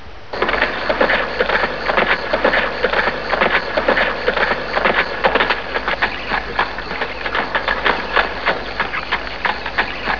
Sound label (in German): PFERD